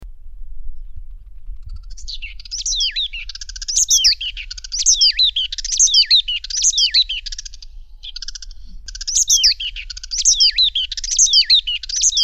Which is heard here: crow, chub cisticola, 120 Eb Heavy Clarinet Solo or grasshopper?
chub cisticola